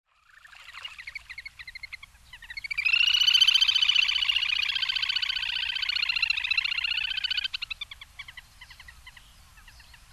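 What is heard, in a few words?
kaitsuburi, Little Grebe; hyogo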